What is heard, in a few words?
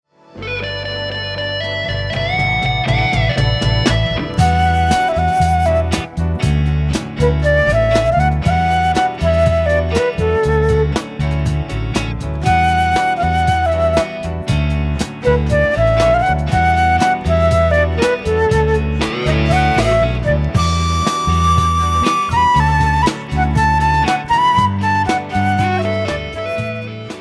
sax